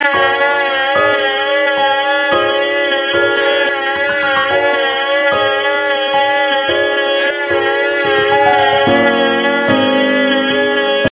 crying; chatsounds

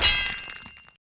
grapple hit

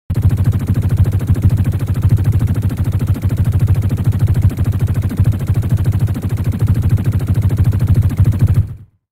Alien Com; Resurgence

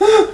scream frightened

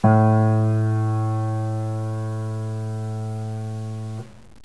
piano a

